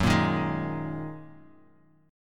F sharp 6th Add 9th F sharp; strummed; JGuitar

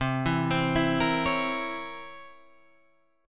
C 7th Flat 9th, Guitar, Open G

